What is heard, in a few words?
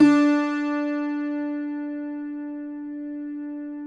CUATRO D 4, Guitars; Spectrasonics; Hans Zimmer Guitars VOL-2